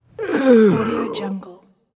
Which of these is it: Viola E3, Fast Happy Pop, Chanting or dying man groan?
dying man groan